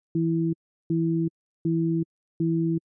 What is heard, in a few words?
low pressure weather